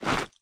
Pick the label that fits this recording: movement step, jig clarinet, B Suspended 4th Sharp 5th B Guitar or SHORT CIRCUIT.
movement step